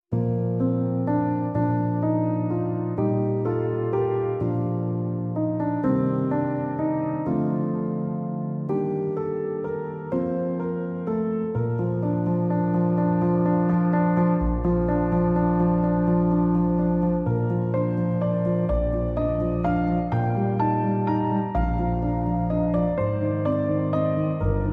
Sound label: Piano Only